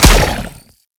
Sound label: Launch Pad; PVP; CTF Orokin Derelict